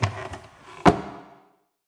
drawer open; Lucius; SFX object drawer open

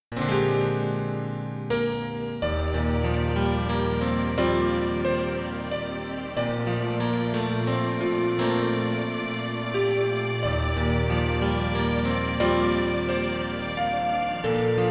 Piano Melody; kuro no juusan gamerip

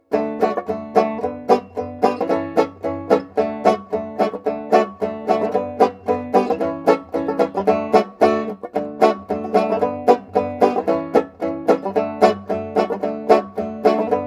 Guitar; Assassin's Creed Syndicate

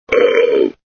SFX sound (baron smacked)

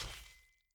Attack Ironball

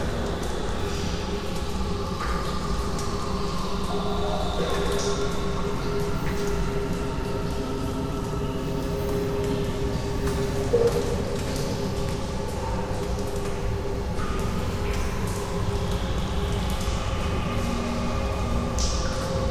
ambience cave; LEGO Batman 3 Beyond Gotham

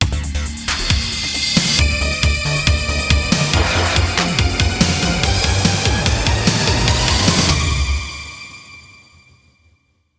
Wave Start Boss; Zombie

